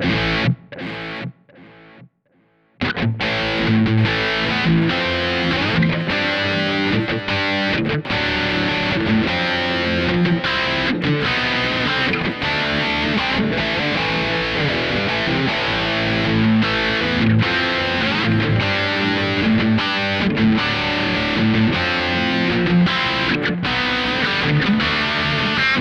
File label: Delay Analog Dist. Rhythm; EQD Silos